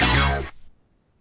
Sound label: orch hit; Punches Hits Discoblasts; single hits